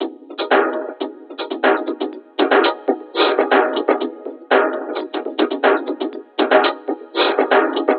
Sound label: Vinyl Loop; Sonic Foundry (Sony Creative Software); Esoterik Beatz